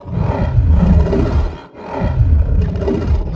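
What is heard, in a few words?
attack evil energy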